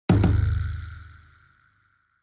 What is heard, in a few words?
Onoma Impact